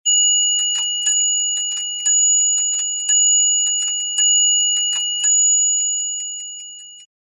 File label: clocks mantle clock small chime